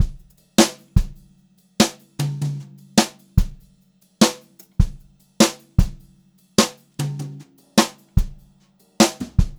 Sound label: JZ VARI, Brushed Drum Loops, JAZZY; USB Soundscan vol.39; AKAI